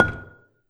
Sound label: Gambang Soft Beater, Gamelan